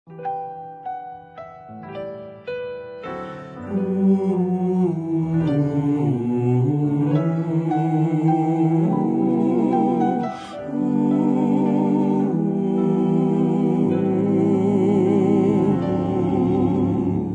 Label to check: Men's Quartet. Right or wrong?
Right